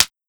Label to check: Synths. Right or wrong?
Wrong